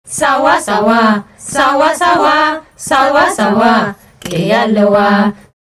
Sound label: african choir